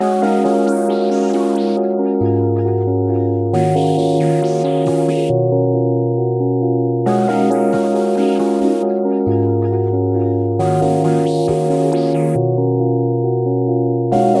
136 F

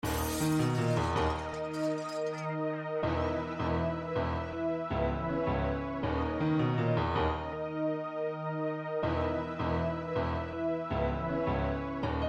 160 D m hard

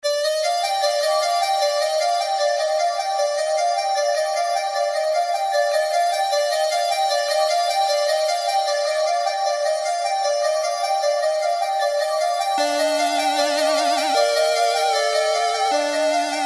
Gm hard simple 153

